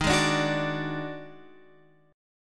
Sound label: Eb-Minor Major 7th Double Flat 5th Guitar Open F, EbmM7bb5 chord; JGuitar